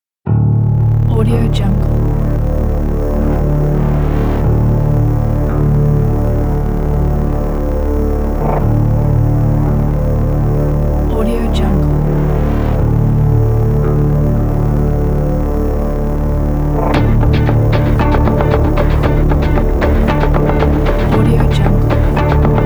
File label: for investigative